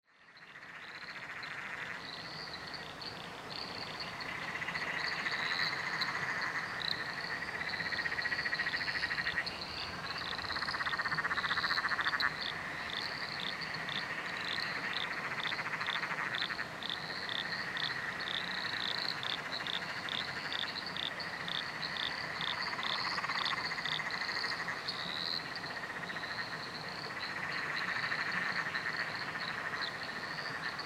nocturnal frog chorus under a bridge royal national park